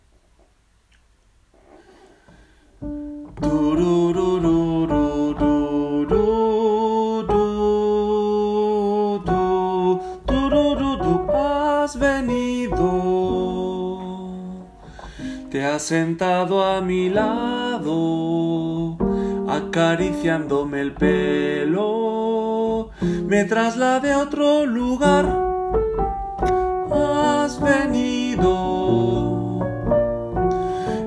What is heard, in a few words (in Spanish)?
Has venido Soprano